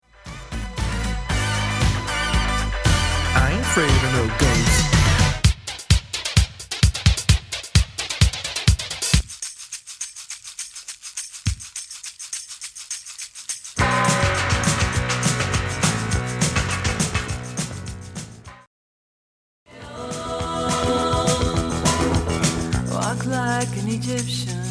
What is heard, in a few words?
80s Medley Transitions; Sound Design